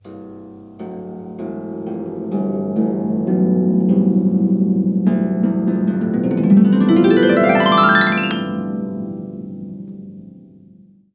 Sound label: harp range